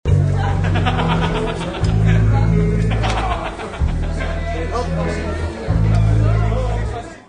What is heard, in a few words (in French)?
Ambiance soirée; bruitage